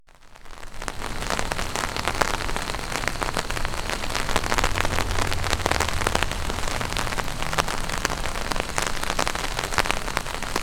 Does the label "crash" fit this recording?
No